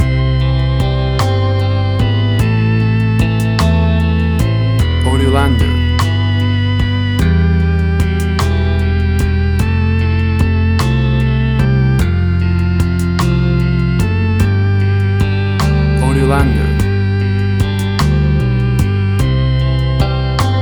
60s Surf Ballad

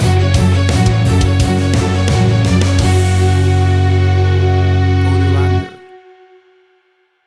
Big Action Gothic Metal Stinger

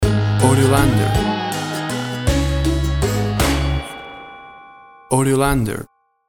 Weird Quirky Silly Halloween Horror Music Stinger

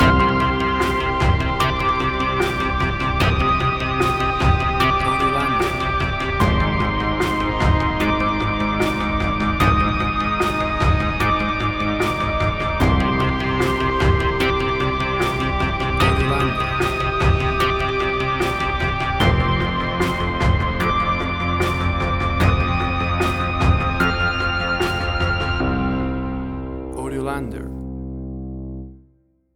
Big Atmospheric Tension

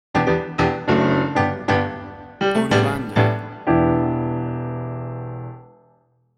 Adult Romantic Sitcom Piano Link Between Scenes

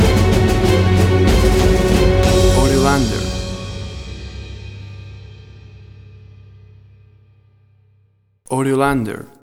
Big Action Trailer Music Stinger

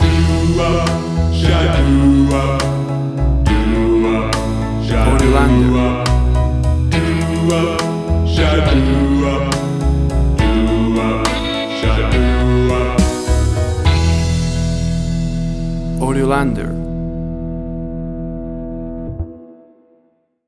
50s Vocal Doo Wop Ballad

